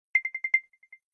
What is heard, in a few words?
Apple Watch